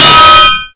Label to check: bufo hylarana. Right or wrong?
Wrong